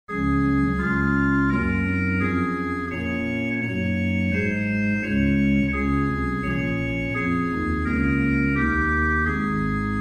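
Rohr 8 + Cornett Gedackt 8 Pedal Sub 16 + Octave 8, organ stops; Reinhardtsgrimma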